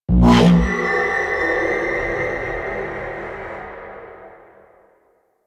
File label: Space Fighter Coil Agent Destroy; Warframe; Enemy Ships; Space Fighter Sentient Coil Agent